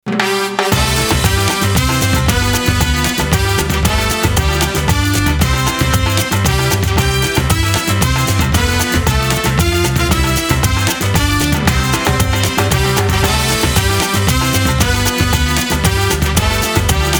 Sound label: instrumentals happy birthday karaoke samba version